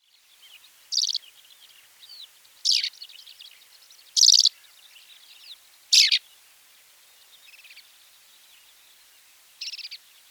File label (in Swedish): Dvärglärka